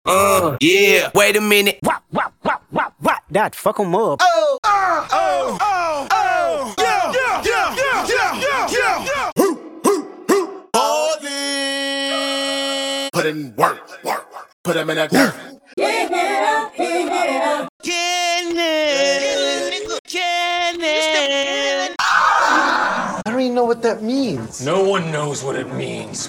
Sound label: scratch sentence